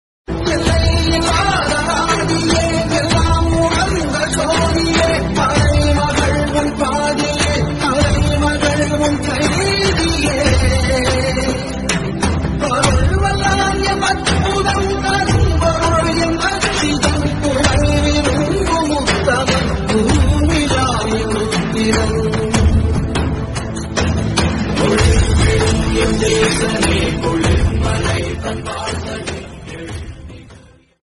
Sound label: Ringtone Tamil